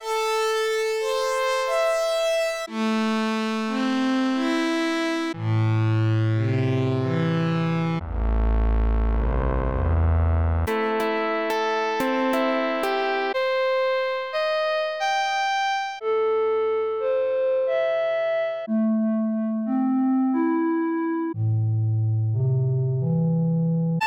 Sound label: synthe orch; plugins